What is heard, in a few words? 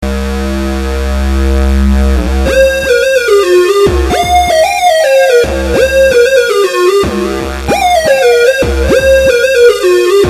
better tracking vco; analog synth